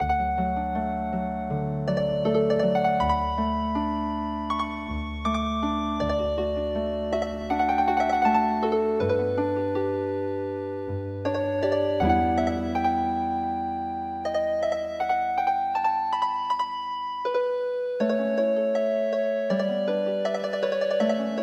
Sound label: Meditation Healing Harp; midi; traditional